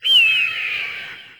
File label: hawk; ambient; prey